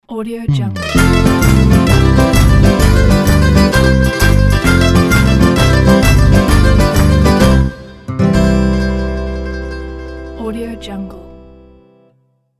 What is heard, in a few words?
italian folk logo